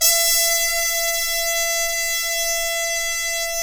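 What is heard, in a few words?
trumpet harmon section; Roland L-CDX-03 Disk 2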